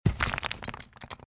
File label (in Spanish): golpea piedra